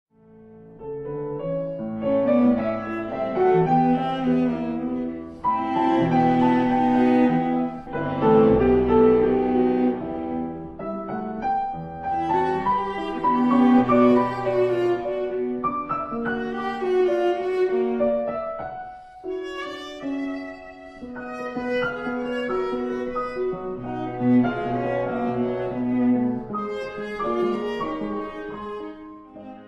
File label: Phantasiestucke For Viola Piano Andante; Fuchs; Op 117